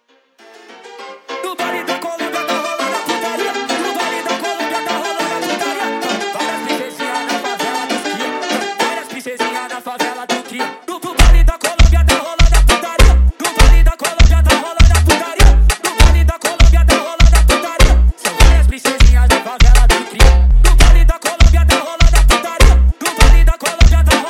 Funk sped Up

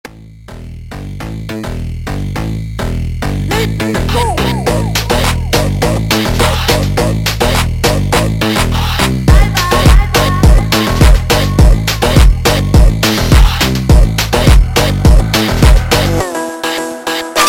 super Slowed

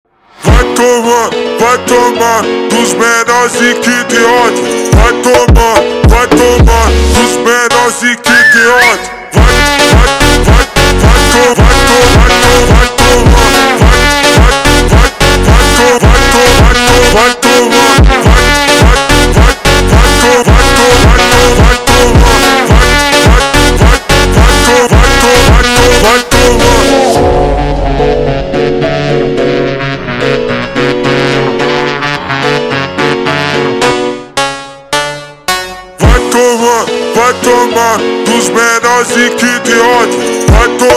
super Slowed

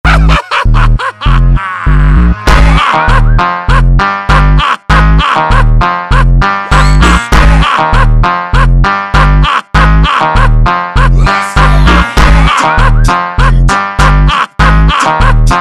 Meme Funk mega Slowed

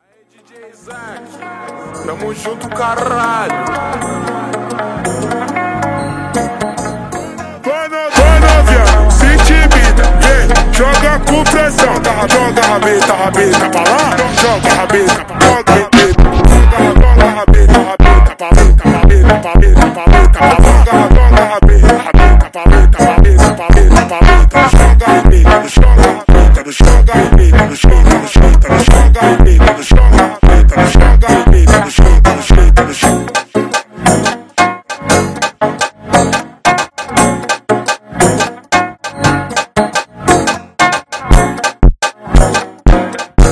FUNK Slowed

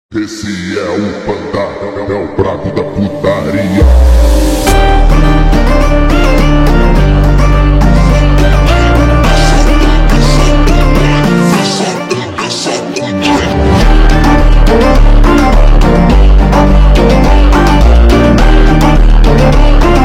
MONTAGEM Slowed

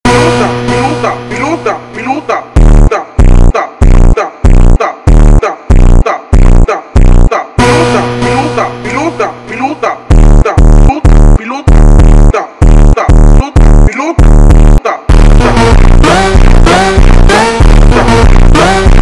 Funk Slowed

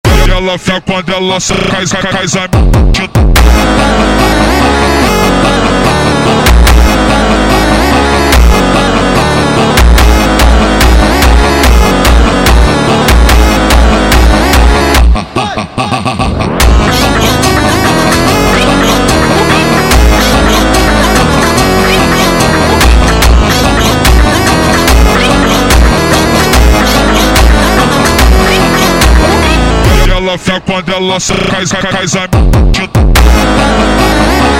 PHONK

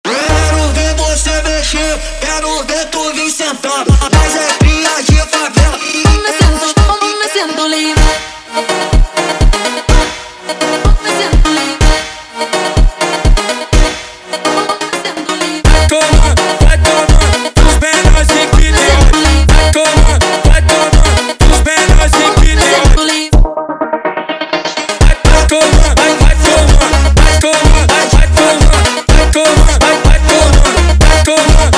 Funk

